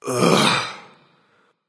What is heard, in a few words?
"ugh"; smite; merlin